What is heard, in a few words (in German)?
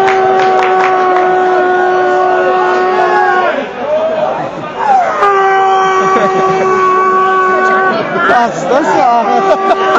Elch